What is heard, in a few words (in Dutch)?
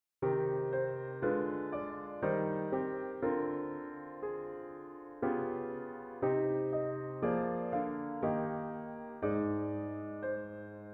gealtereerd leidtoonverplaatsing enharmoniek